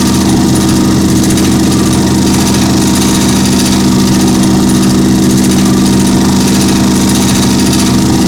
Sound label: Ocean Reactor Loop; Ambience; Props; Warframe; Grineer Ocean